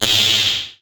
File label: SOUND EFFECT, Metal; AKAI S6000 CD-ROM - Volume 1